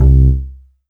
Kawai K1r Acoustic Bass C2

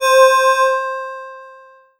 Yamaha TX81Z Krstl Choir C5

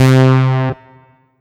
Korg NS5R Bass and Lead C3

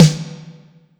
Snare Drum; Drums - Snare

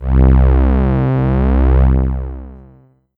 Korg DW 8000 Wide Synth Strings C2

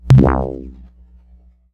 Yamaha PSS-140 Drip C3

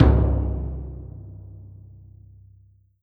Roland JV-2080 Ceremony Timp

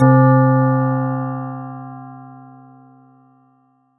Ensoniq ZR-76 Trans Bell C3

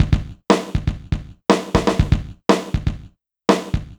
Yamaha V50 Industrial Beat 120bpm

